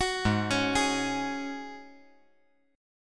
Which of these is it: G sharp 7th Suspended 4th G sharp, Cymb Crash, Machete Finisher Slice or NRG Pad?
G sharp 7th Suspended 4th G sharp